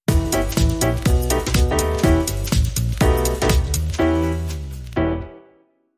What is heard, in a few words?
Aahhs And Brass